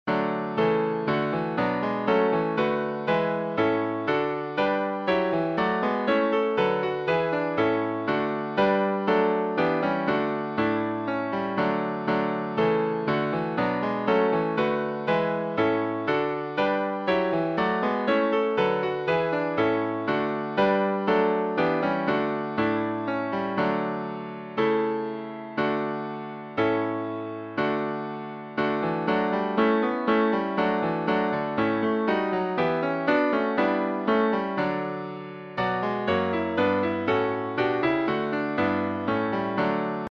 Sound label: Hymns